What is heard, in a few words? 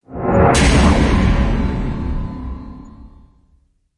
Resonated Hit Swell; Ninja Tracks